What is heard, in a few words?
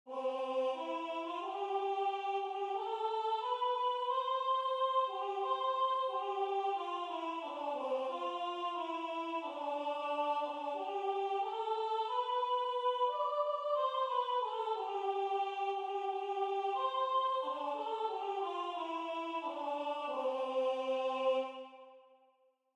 Soprano